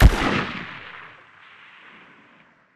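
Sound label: mid distance attack tail; Titanfall 2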